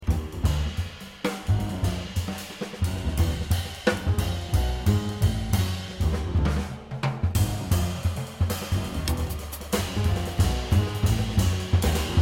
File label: drum solo